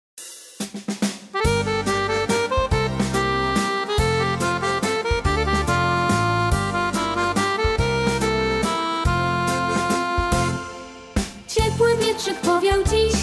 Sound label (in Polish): piosenka Spotkanie z wiosną